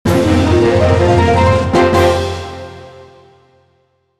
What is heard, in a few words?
Player Wins Fanfare; Pokemon Battle Revolution; wii